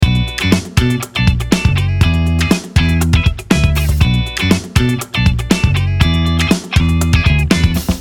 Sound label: Funk Master TV Caster Loop